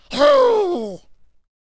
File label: Void Cultist Attack Vocals